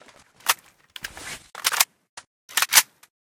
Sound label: veresk load, weapon; metrocraft